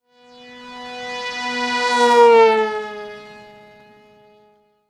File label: RC plane flying radio controlled; rc toys sound effects